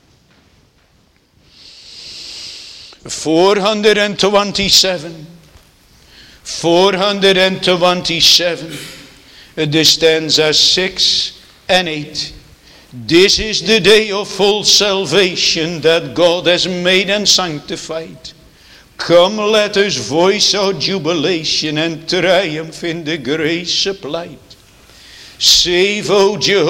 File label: Singing